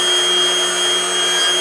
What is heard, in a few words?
spin loop